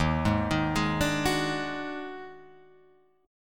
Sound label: Eb Minor Major 7th Double Flat 5th, Guitar, Open G